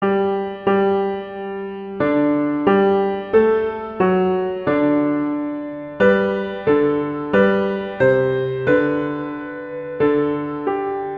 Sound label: O Come All Ye Faithful Adeste Fideles; Christmas Beginners